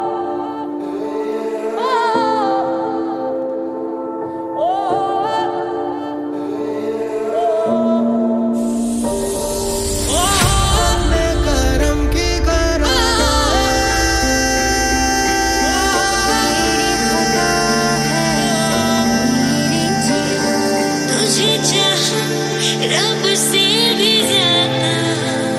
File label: remix song ringtone